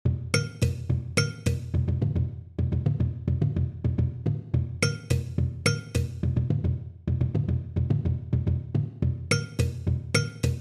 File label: Percussion